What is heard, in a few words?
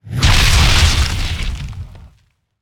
Ability Spider Trap Grab Explode; Lurker; Evolve; Gorgon